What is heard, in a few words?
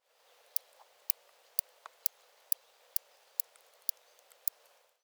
Stethophyma grossum